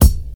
crispy kick single hit c key, kick drum single hit tuned to the C note